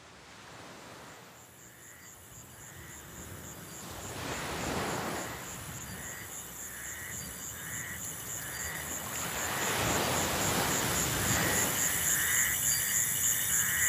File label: Music in Nature Ambiance